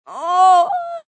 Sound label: Moan Squeak, Female moan, squeak at end, pleasure (human voice); Groaning